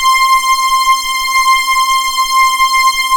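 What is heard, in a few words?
MKS80 STRINGS C5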